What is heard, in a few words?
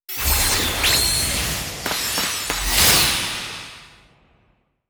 Ingame Playstart; Se Ingame Playstart Kei